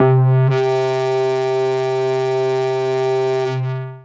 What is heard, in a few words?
single-synth, techno, c; Techno ID